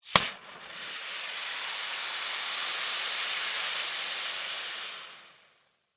Soda Bottle Open, Foley, Soda, Bottle, Open, Spray, Fizz; Fizzes; Objects; BlastWave